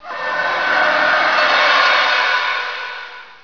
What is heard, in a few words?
scary